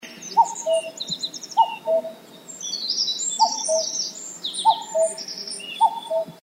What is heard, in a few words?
cuckoo